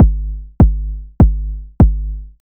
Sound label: Drum Kick Bass